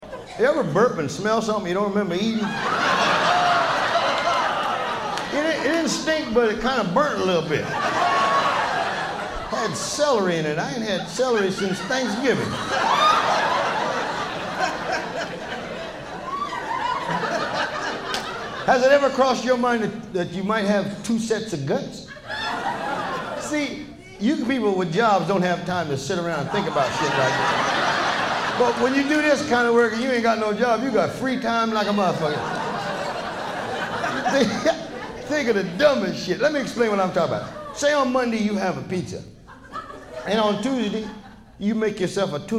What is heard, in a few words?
Burp